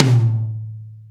Ballad Tom, 70's Ballad; Sonic Foundry (Sony Creative Software); Drum Tools; Laidback; Toms

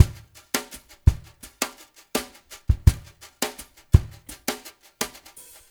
DRY DRUMS; Spectrasonics; Liquid Grooves 1 & 2

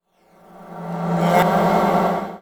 SFX, Trance NRG

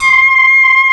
OB, OB modular; Greytsounds VOL-1

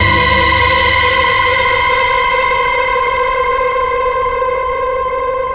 Tense Keys, Drum n Bass; KJ Sawka; Drum n Bass & Beyond

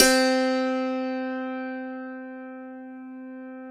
C4, DULCIMER; SoundBytes; Early Patches

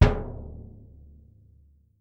BD F, Percussion; Miroslav Vitous - Percussion & Harp

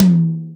Yamaha CS6, Drums

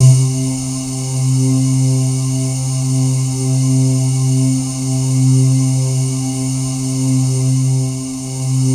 C3, Synth Leads

